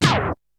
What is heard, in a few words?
Scratch; Drums; ZERO G - Datafile DISC 3